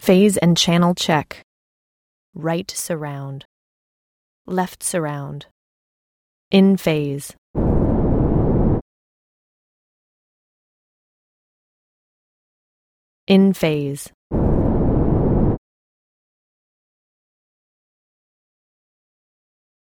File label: phasecheck